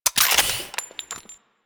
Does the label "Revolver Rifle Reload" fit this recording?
Yes